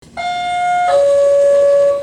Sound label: doorbell p a system